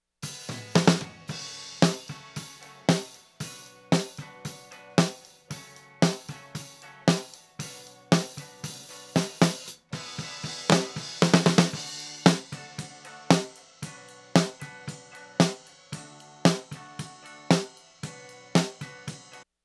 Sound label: snare clean; microphone pre; Dave Hill Designs